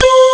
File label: voice; ST-XX Amiga Soundtracker Sample Packs